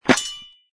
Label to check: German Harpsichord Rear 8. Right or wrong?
Wrong